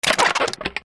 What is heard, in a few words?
door lock